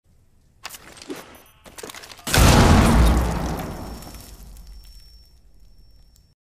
nitro cell sound effect; C4; C4 R6 levi

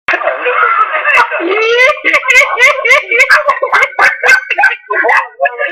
i hi hi hi hihi

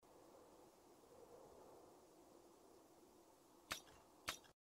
bouncing

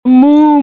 boob moo

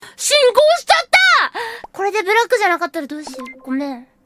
polka

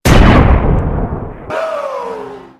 flair gun

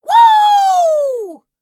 wooo ooo ooo

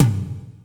low tom

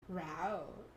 rawr

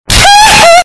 hee hee sound effect ear rape

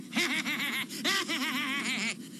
laughing

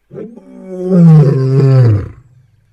roar

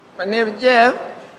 ringtone

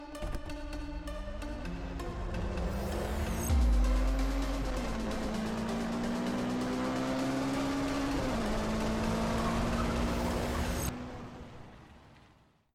car hype

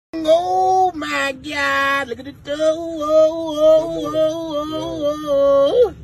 woahooh woah

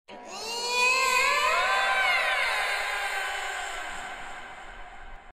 iyaaa

